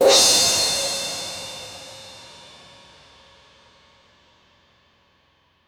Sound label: cymbals crash